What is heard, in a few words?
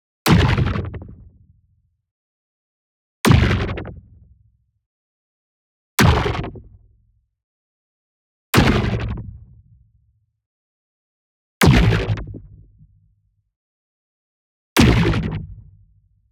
IMPACT LARGE Filtered Energy Jolt; boom library; Matter